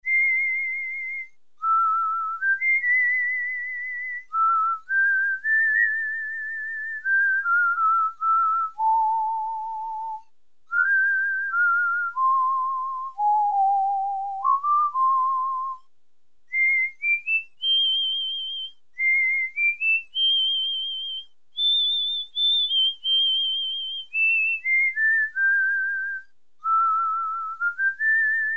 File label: WHISTLE, Casio CZ-1000; Preset